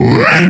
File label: pain; basebuilder; hellgame; cstrike